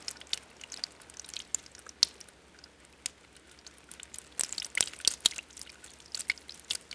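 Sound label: waterfall trickle loop; water splashes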